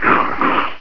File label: hurt Skeleton